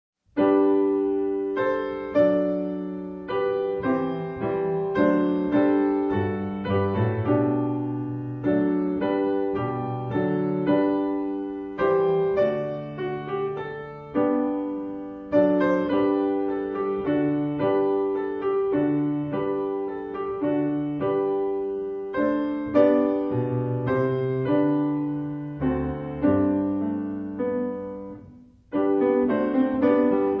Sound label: star vicino accompaniment